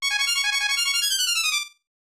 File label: Battle Start; crayon shin chan nagagutsu dobon; snes